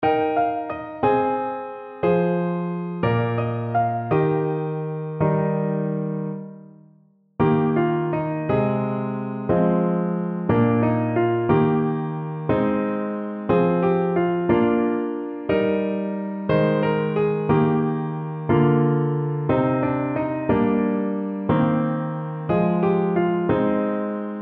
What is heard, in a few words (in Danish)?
Klaver 3 stemmer Eb dur Hoej 3 vers